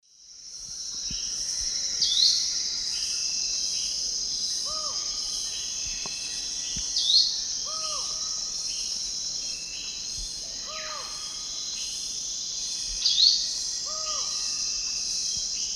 Myiopagis gaimardii, call; S. America; Eastern Lowlands

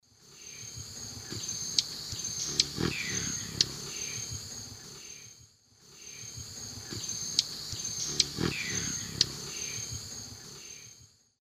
Thalurania furcata, flight call and hum; S. America; Eastern Lowlands

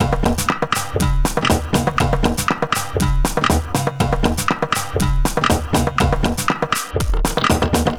Offset Groove, Composite Beats; Electronica

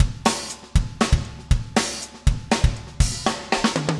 funk, 120bpm, loops

